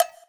Wood, Block Stick; Equipped Music; Loungin House

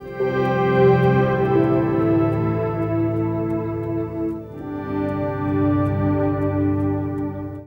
Acoustic Drone, Long Loops; Sonic Foundry (Sony Creative Software) - Friendly Fire; DroneLoop

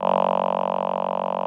VP-330, String Machines

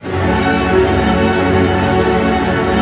ARP F#, STR ARP MIN; Sound On Sound - Peter Siedleczek's Orchestra

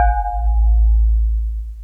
Electric Pianos; Wizoo Electric Pianos

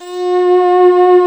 METAL PAD G4; Greytsounds; Scott Peers Sound Engineering

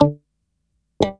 GTR, FUNK; Hip-Hop & R&B; USB Soundscan vol.47; AKAI

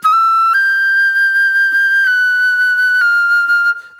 Soul Plate Flute

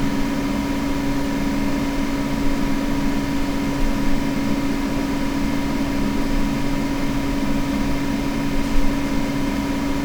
room tone

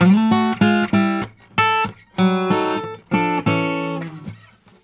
Blues Guitar lick; ILIO; Fretworks; 96 HIRUM LICK

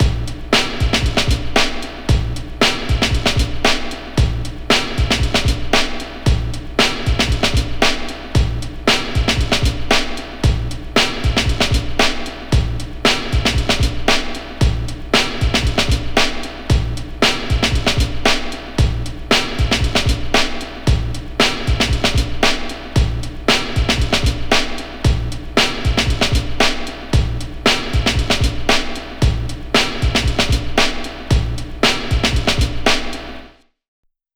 115 Ultradustbin, breaks; Lockdown Breaks

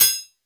PERC, 160 BPM; Ueberschall; Drum & Bass Flash Trackz

